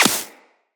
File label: Laser Impact Flesh; Weapons; Bullet Impacts